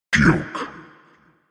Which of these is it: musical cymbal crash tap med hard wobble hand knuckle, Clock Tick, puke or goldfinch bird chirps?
puke